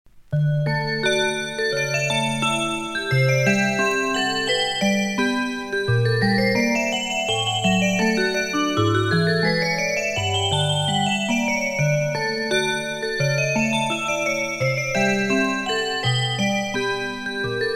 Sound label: rmi kc bells